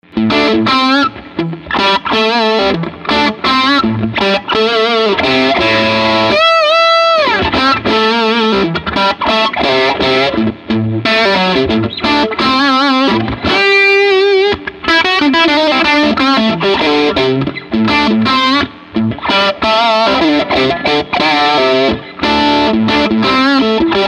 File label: 6V6 tele boogie